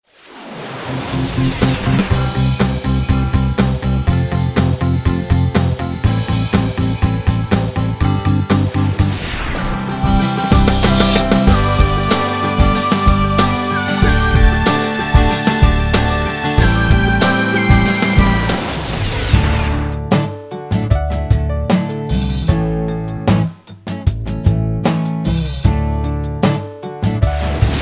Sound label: ukazka pop